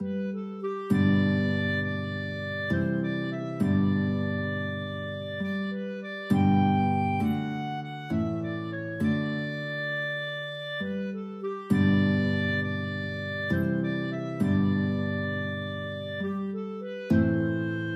blessed assurance clarinet guitar, guitar clarinet duet